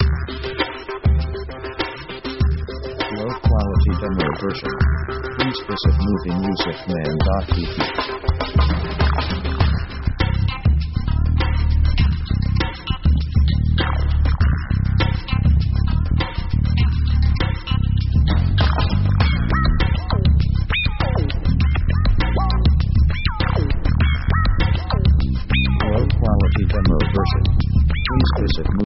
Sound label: electric march